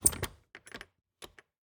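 Refrigerator, old fashioned, wooden, steel, brass clasps, hinges, open, jostle; Eiravaein Works; Vaeyan IV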